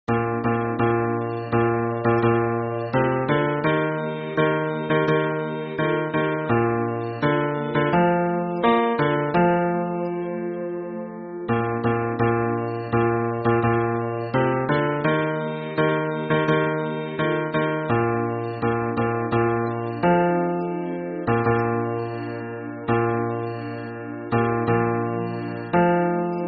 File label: hymn bass